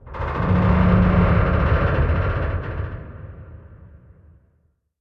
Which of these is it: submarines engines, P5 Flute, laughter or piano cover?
submarines engines